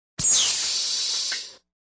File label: Звуки пара Sounds of steam